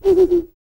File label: BLOW, BREATH FX; Spectrasonics; Vocal Planet; Jazz FX